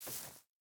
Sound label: footstep burlap grass walk; Rust